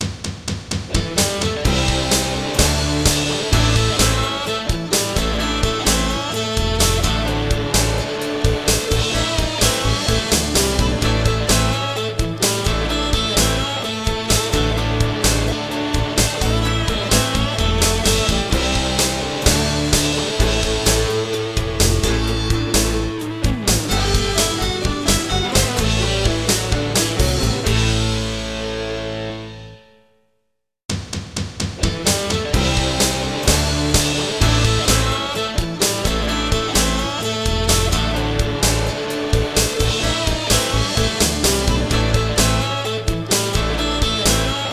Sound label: round clear; Remington Great American Bird Hunt; wii